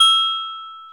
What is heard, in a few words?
BELL; E-MU Formula 4000 Series Vol. 1; Hip Hop Nation